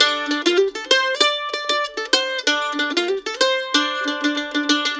LICK, Blues Guitar

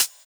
Hhat, HiHat; Equipped Music; Loungin House

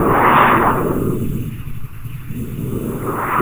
Wave SFX; Trip-Hop

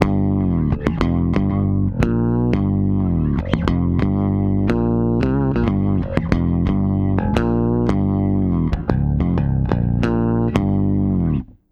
SHOOT UP G, Bass, 90 BPM; Sampleheads; Ultra Freakin' Bass

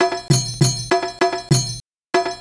Belly Dance Tabla, Acoustic Beats; Sonic Foundry (Sony Creative Software); Myths of Technology

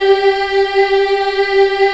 G3 child EH R, Choir; USB Soundscan vol.28

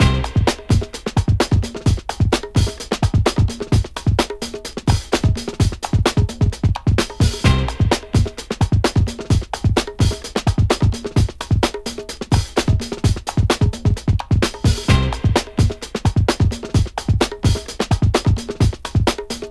129 Perky Geet Rave, Breaks; Lockdown Breaks

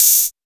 D&B Hat; neuro

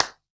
CLAPS HIP, CLP; Best Service Dance Mega Drums

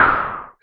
SNR, SCI Prophet-5 synth drums

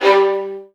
VN FX, Virtuoso Strings

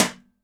metal, handy percussion

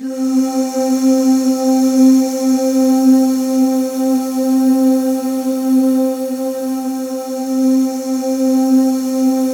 C4, Synth Leads

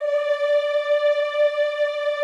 EEH D4, Classical Choir; Best Service; Extended Classical Choir

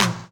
CLP, Dance Mega Drums; Best Service

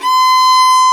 fiddle C5; InVision Interactive; Lightware VOL-1; Instruments & Percussions

